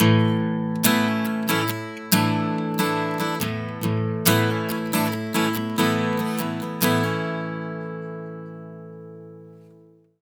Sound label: 70 MAJ 2 CHD A, Acoustic Guitar Loops; Electric & Acoustic Guitar Loops; CHORDS; USB Soundscan vol.04; AKAI